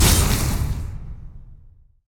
light attack hit; Aragami

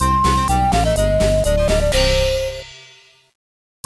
Jingle Mini Game lose; Little Wingels; NDS

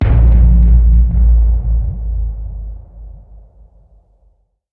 trailer hit dark drum; The Warlock of Firetop Mountain

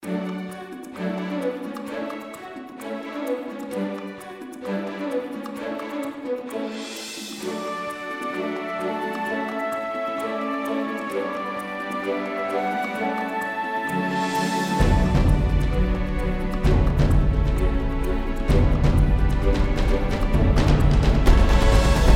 Story End; Shattered Throne

